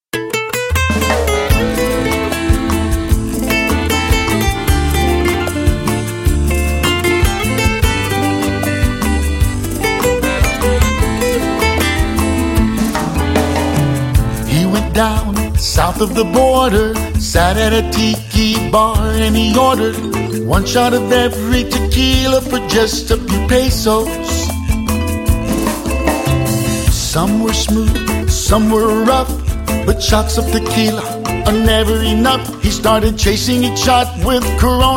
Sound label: Tropical Country